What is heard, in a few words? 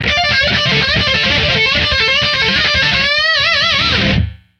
Guitar Shred